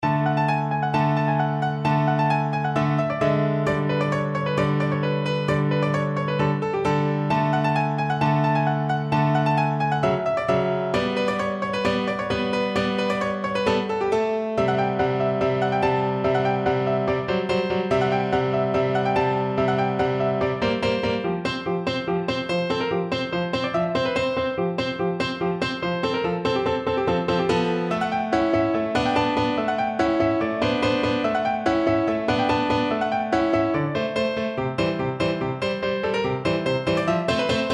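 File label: romanian folkdance electric piano; bartok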